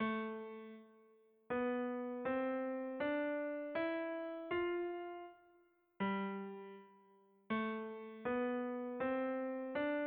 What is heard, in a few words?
melody